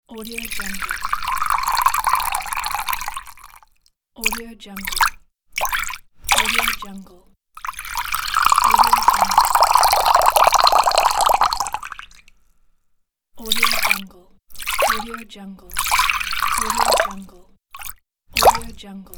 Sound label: splashes of water and overflowing drops